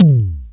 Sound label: TOM, House Side Of 2 Step; USB Soundscan vol.51; AKAI; TOMS